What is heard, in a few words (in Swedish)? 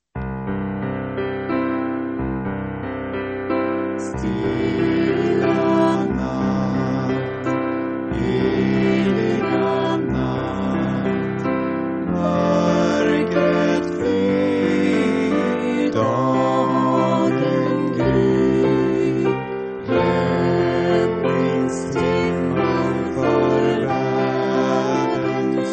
bas; Stilla Natt